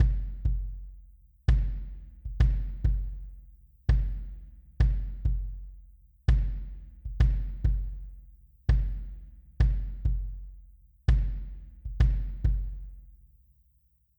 Wave Drum KD

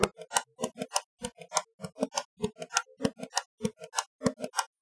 Bongo

